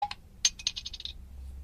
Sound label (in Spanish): whatsapp botella abierta